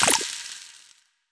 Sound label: Projectile Burst